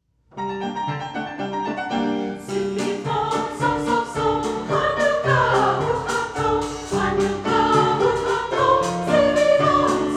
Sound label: Traditional Jewish; S'vivon